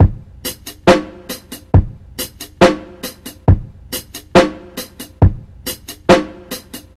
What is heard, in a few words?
69 bpm breakbeat sample b key, Free drum beat - kick tuned to the B note. Loudest frequency: 1415Hz